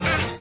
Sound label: surskit ah